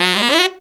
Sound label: TENOR FX; Zero-G; Phantom Horns